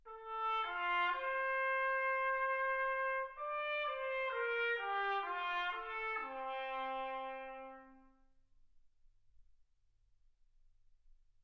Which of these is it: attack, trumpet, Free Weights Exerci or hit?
trumpet